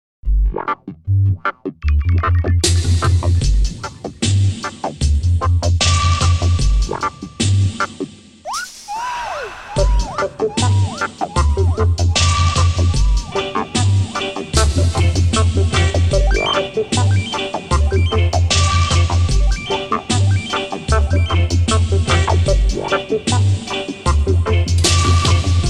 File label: Dub Bubble